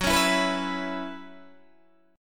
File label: F sharp 7th Suspended 4th, Guitar, DADGAD